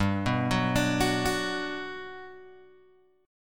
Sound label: G Major 7th, G, down, Guitar, Open G